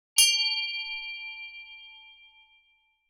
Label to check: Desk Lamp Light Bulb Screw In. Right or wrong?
Wrong